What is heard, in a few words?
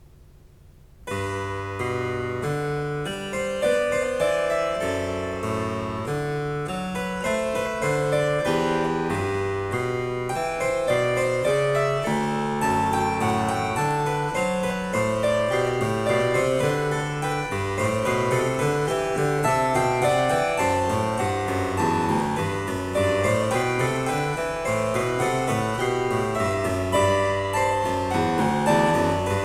Variatio 4 Canone all'Unisono a 1 Clav, Cembalo; BACH; Goldberg Variations